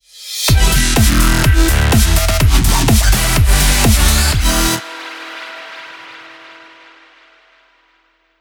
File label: Energy Logo; Boomopera